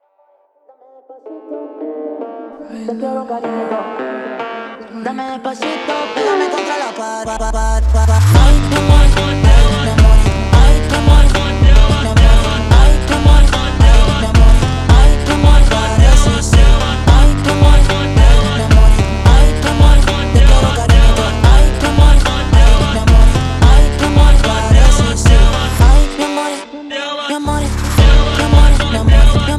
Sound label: FUNK Ultra Slowed